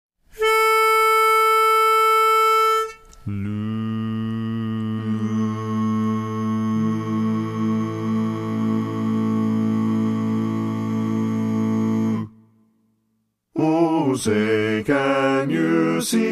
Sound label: National Anthem Bass, Chorus